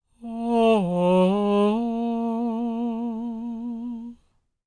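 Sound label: falsetto, Vocal Planet Jazz FX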